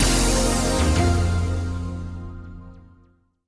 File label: large Win Autoplay; king of 3 kingdoms